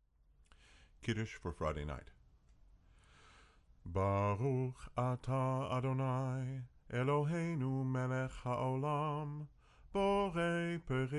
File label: kiddush, cantor